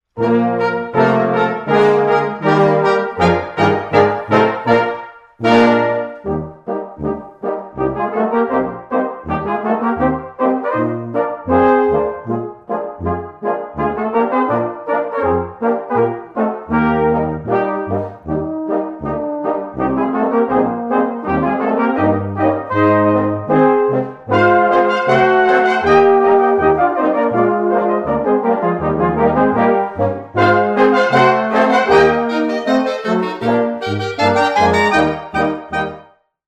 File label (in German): Doerfliche Blasmusik; Der Hohendilchinger